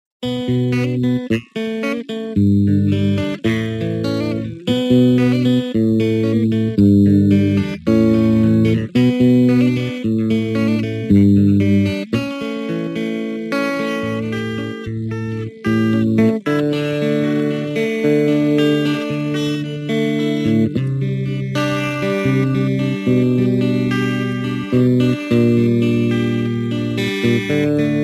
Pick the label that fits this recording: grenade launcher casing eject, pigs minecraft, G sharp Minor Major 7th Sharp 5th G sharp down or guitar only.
guitar only